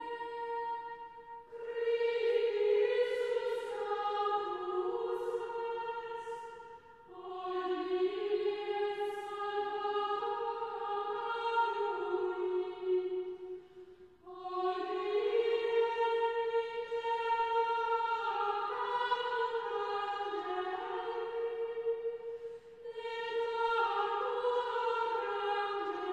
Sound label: Chant Antiphon; Traditional; Chester Processional